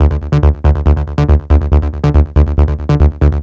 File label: NRG Bass, Trance